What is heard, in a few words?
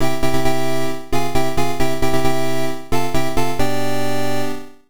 Fanfare; pc 98